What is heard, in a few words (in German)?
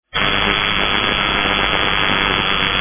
poljot neu